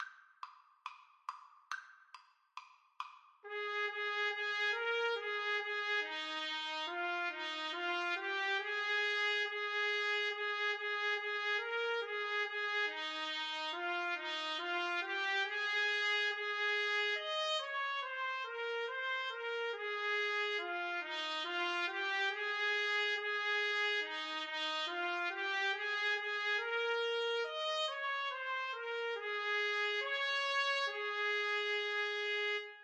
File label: goodking, Trumpet Duet